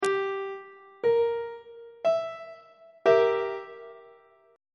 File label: d double sharp diminished 1st inversion on treble clef